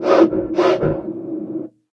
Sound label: peek sniff; hl2